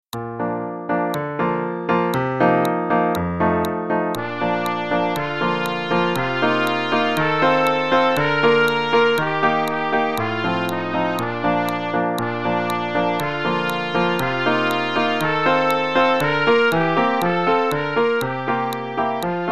Play a long in Bb, both parts, with click, qn=120

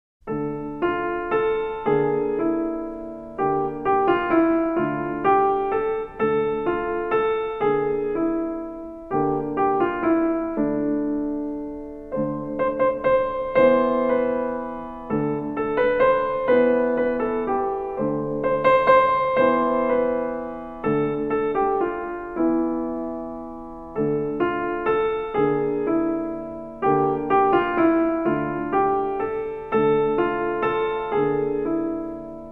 Arabian Dance; Brown

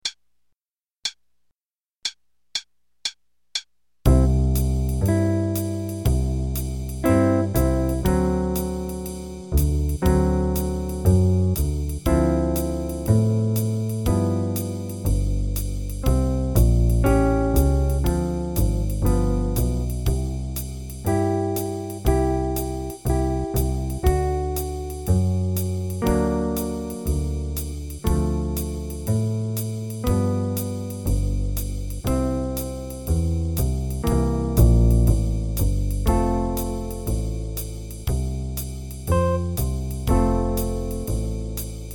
Play-a-long, 4 choruses qn=120

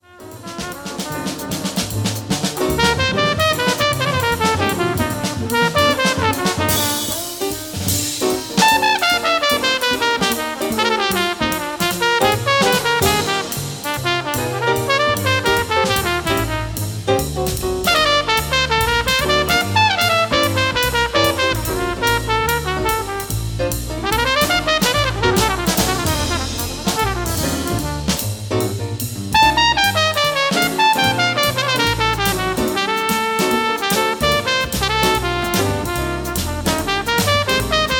jazz trumpet, solo only

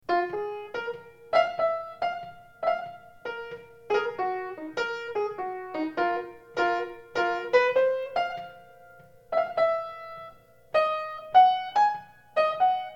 Blues melody only

